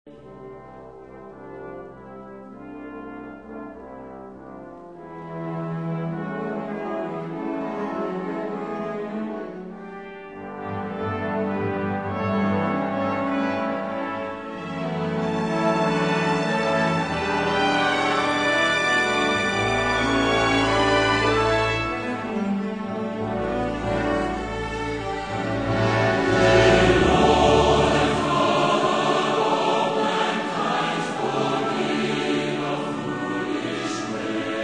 Orchestra and Choir, Hymns

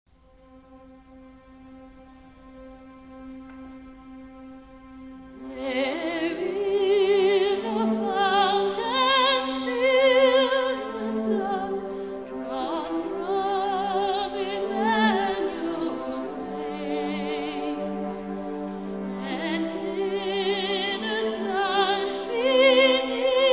Philharmonic Orchestra + Choir

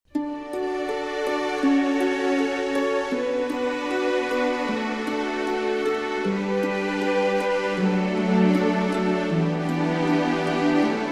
Christmas Meditations for Orchestra

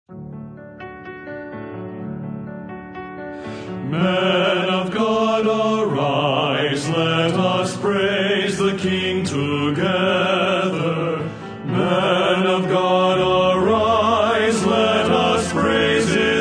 Men's Quartet

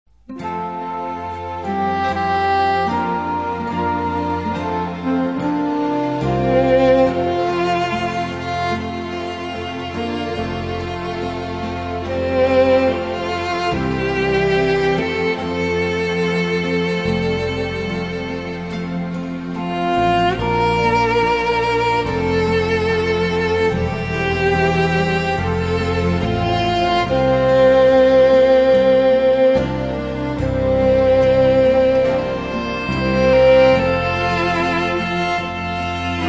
Instrumental Praise

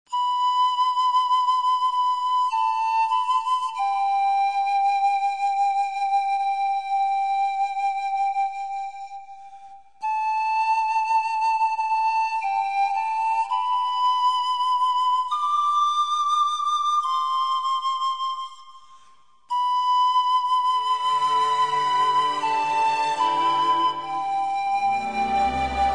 Orchestra Panpipes, O, How I Love Jesus (Medley)

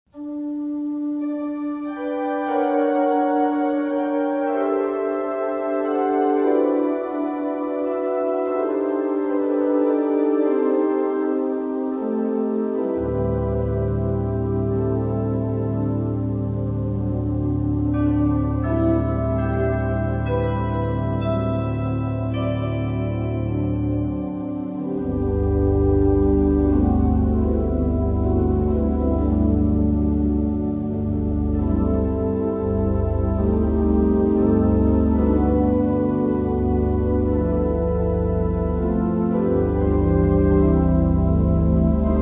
(with chimes)

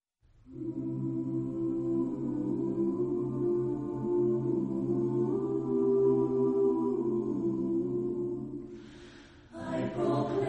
Chorale, Voices in Praise acappella

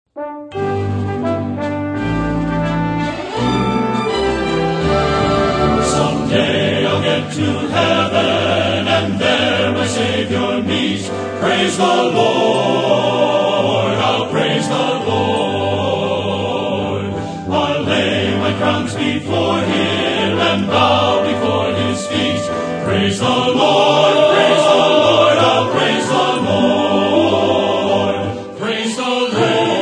Men's Choir

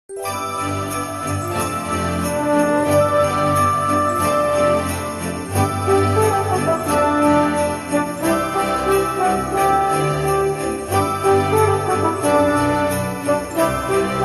Christmas Meditations for Orchestra

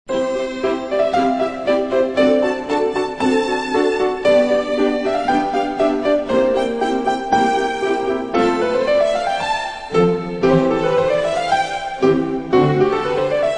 Orchestra

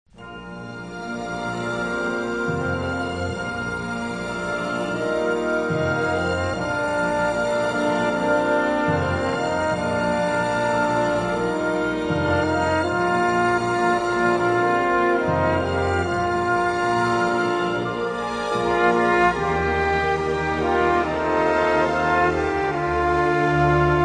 Orchestra, Strings; Majesty Orchestra

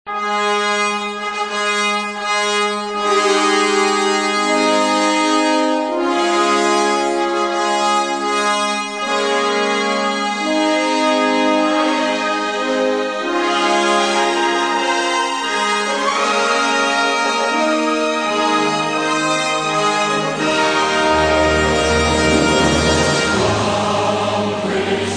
Orchestra Amen Choir, Hymns

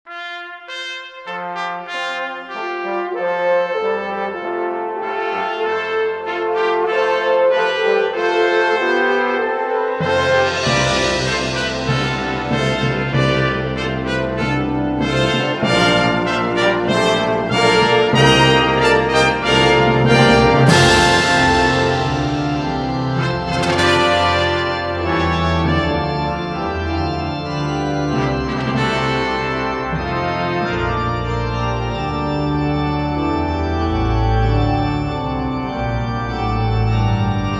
Hymns For Org

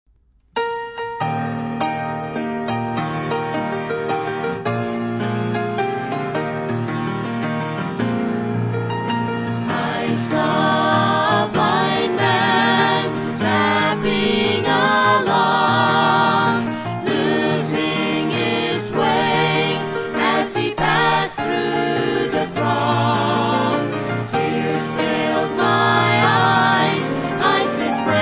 Quartet

